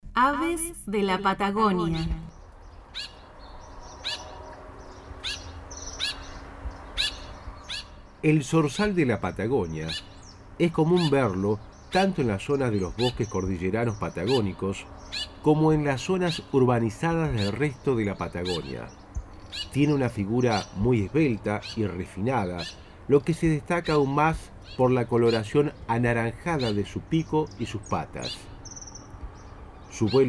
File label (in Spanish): zorzal patagónico